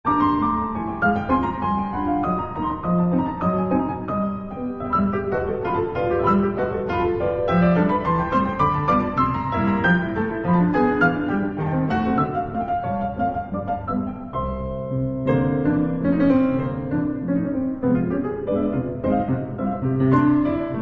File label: piano - Humoreske; David Golub